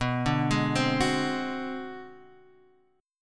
B Minor 6th Flat 9th B, chord

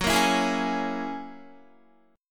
Gb 9th Gb down Guitar 4E,4A,5D,5G,5C,6F; Gb9 strummed

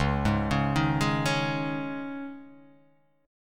Db Suspended 2nd; arpeggiated; Dbsus2; JGuitar

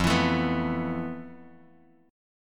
F Major Sharp 11th, Bass Standard; strummed; chord; FM#11; 13,19,19,16; JGuitar

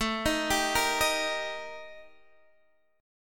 Eb Major A; arpeggiated; Eb/A

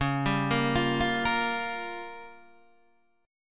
Db 6th Flat 5th Db, down, Guitar DADGAD

